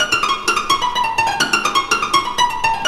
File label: KORA; Zero G - Ethnic